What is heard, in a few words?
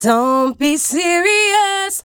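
109, F minor, Bridge Lead Female Vocal Dry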